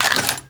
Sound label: Investigate Pickup Gun; Warframe; Cephalon Weave